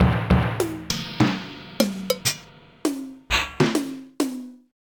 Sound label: Drums; Hylics